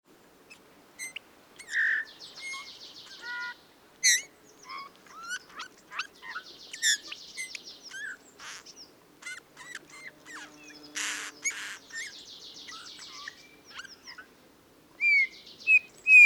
suara bullfinch

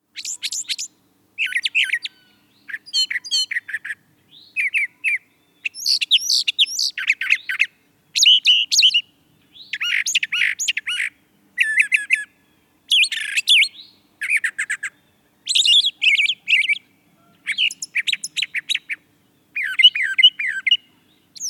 suara brown thrasher

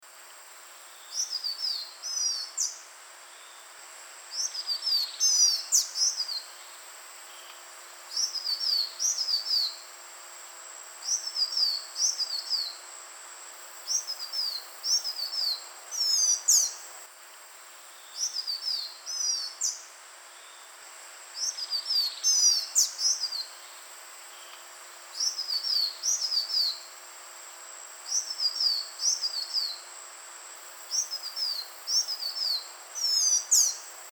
suara cipoh jantung

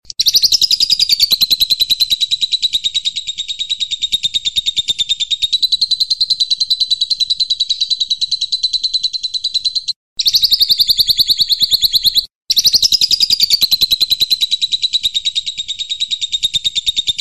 masteran cendet lovebird gereja tarung cililin belalang

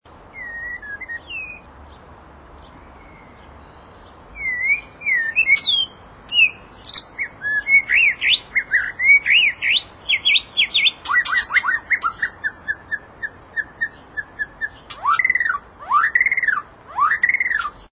suara mating murai batu

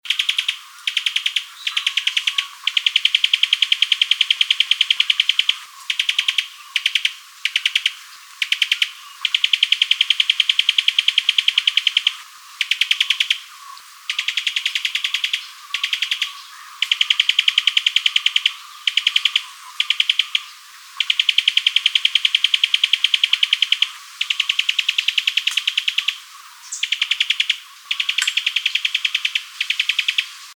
suara cuban tody

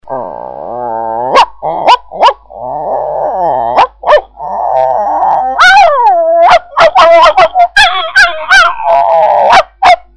anjing tengkar

